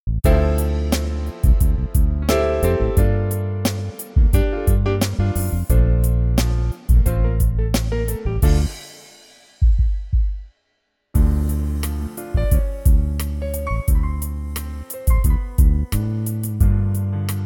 smooth

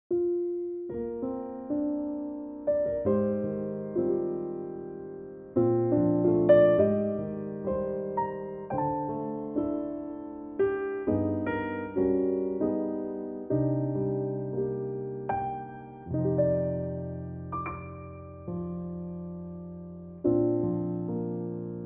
Bb piano; Bb Pianotrak; key - Bb - vocal range - F to B (huge range!)

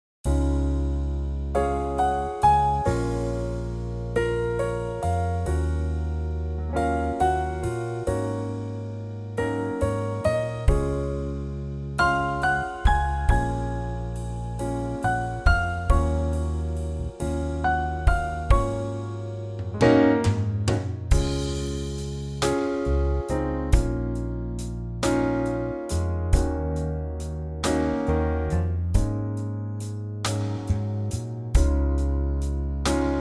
Db trio

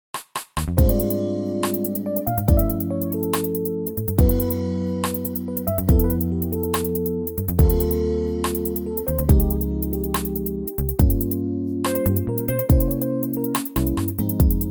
jazz smooth; key - Ab; Jazzville Smooth; The coolest of cool 80's groove take on this classic standard, with oodles of soloing space

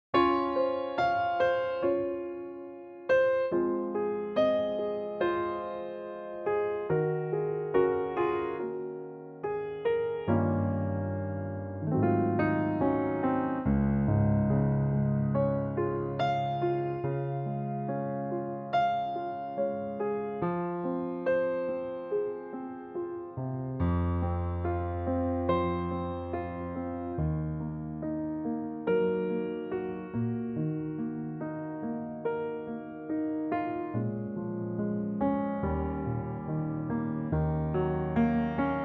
Db piano; key - Db - vocal range - A to Eb; big ballad; Wonderful big ballad

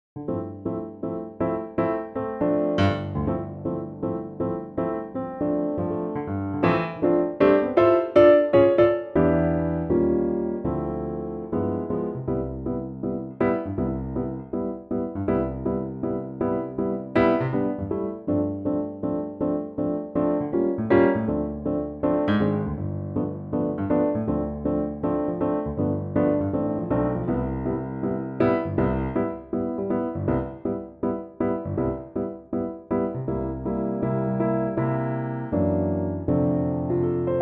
C piano

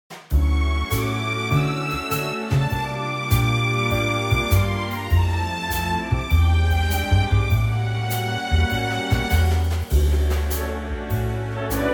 D orchestra; key - D - vocal range - G to B; Gorgeous new orchestral arrangement of this classic standard.

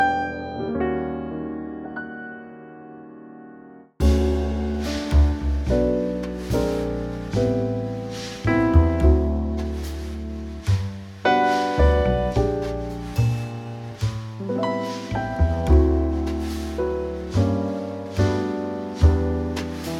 Trio D; key - D - vocal range - D to F# (suit lower female ranges)

